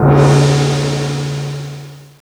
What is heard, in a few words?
jing; Net Power 2000 #9; Everland